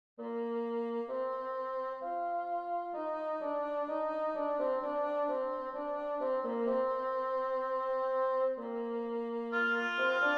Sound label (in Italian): Fuga in Si bemolle maggiore